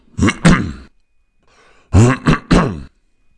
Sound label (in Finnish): rykiminen